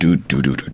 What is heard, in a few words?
test music; Bionic Commando 2009 PC